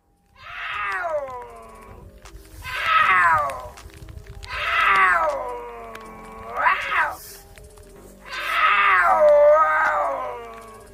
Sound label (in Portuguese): Onca parda